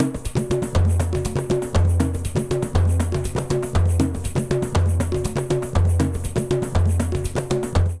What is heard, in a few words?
Baion 120bpm, Latin Percussion; Ableton; Factory Packs